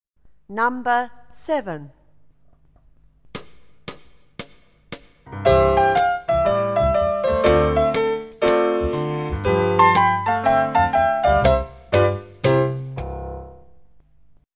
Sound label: primary tap taps toe taps